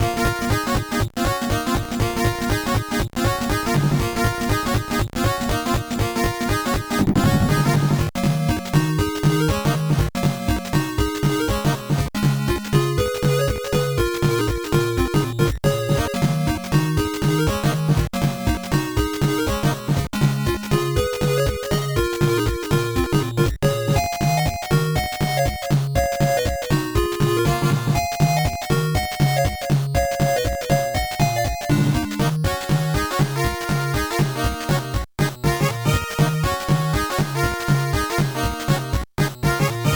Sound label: Stage BGM; final mission 1990 nes gamerip; Mono~Stereo